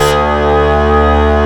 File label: OB KNIFE D2, OB Synths, Analog; E-MU Formula 4000 Series Vol. 3; Analog Odyssey